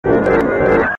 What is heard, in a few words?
grrrrr cry